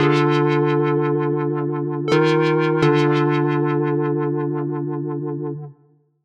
Keys Line 85, 85bpm, hiphop; musicradar

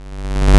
YM Bass G, chip; ChipShop Deluxe; ChipShop Hits

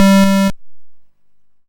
YM Synth Gm; SYNTHS; CHIPSHOP DELUXE; CHIPSHOP HITS

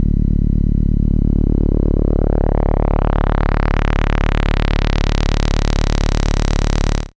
SID Bass D, chip; CHIPSHOP HITS

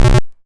YM Arp D#m; chip hits; arps; CHIPSHOP DELUXE

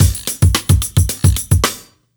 Beat 110bpm; musicradar

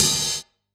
Ride; musicradar; Drum Hits B

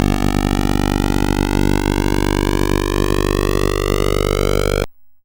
Moto; SFX; YM; ChipShop Deluxe; ChipShop Hits

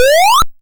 YM Jump; SFX; ChipShop Deluxe; ChipShop Hits

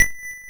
Vibra B3; multi-samples; Vibraphone; CHIPSHOP DELUXE

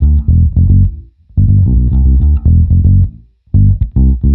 Bassline 110 D, hiphop, 110bpm, Bass; musicradar

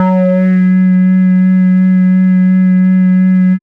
Bass B F#3, drumnbass 170bpm; musicradar; Bass B Multis

